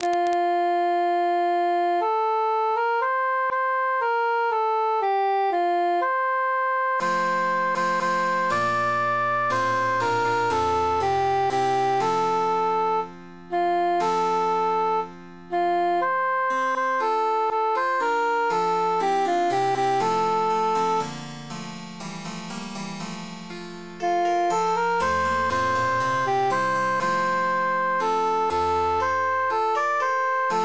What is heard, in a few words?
cantus emphasized; fair phyllis